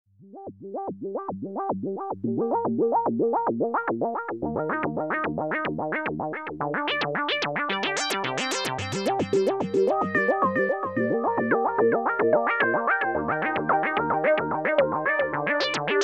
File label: electronic music